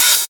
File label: Open Hihat, Cymbals; Vengeance Samples